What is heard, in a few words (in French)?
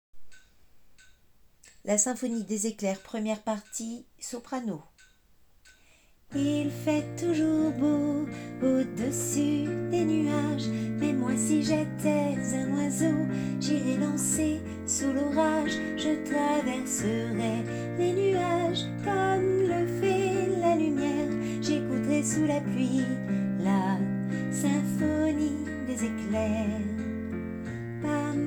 soprano